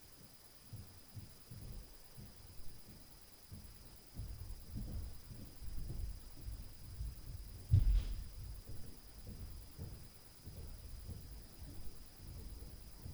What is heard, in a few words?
STAIRS NOISE; Night Noise